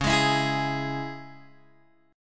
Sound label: Db Minor, Guitar Open G